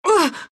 pain, ru; Dota 2

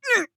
Grunt Hit; paladins; ChurchHill

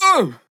Grunt Attack; Makoa; Plushy; Paladins

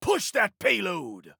Attack; Owl; Paladins

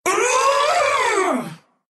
grunt, ru; dota2

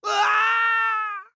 Falling; paladins; Demon

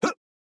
Grunt Jump

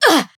Grunt Hit; paladins; Mage

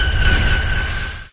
Light Strike Array cast; Wyrmwrought Flare

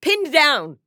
Mage Taking Damage; Realm Royale; Radon Flux

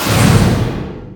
projectile launch; Vengeful Spirit

